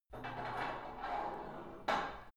put light weight onto barbell soft reverby, fitnessstudio; sport freizeit